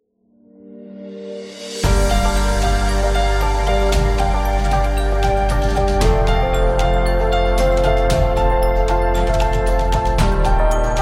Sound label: Inspiring Motivational; eco-friendly